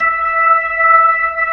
B-3, Jazz

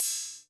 RY CYM

